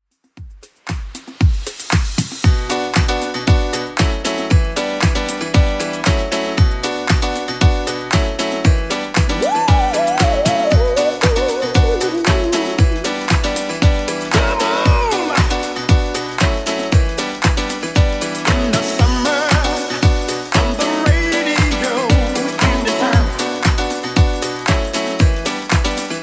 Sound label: 80s Club Edit 116bpm